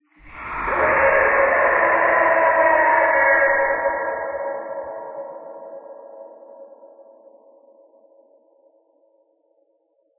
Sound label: Forest Scream